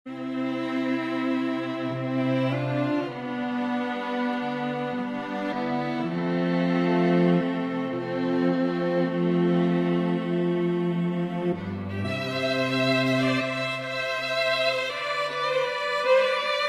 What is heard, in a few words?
String Trio